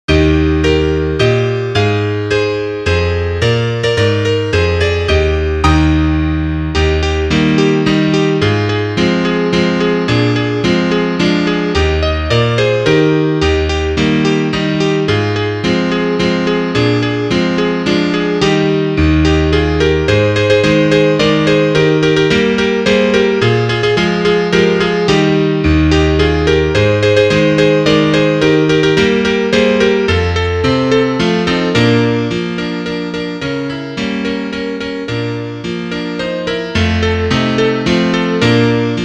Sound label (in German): Klavier Solo, trompete; Ländlicher Tanz; (Duett)